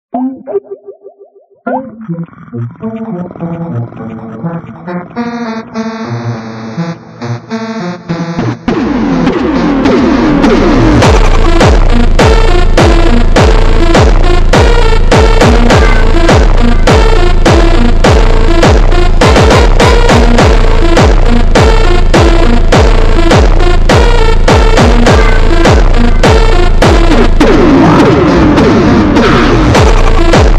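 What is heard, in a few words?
jumpstyle ultra Slowed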